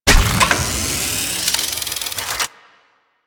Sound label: Arch Dual Pistol Charging; Weapons; Warframe; Archwing